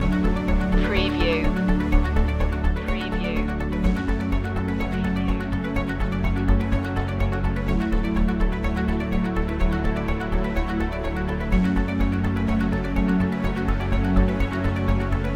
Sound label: machine land loop